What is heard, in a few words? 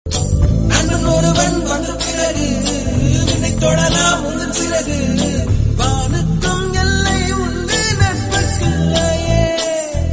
College Friendship Songs Tamil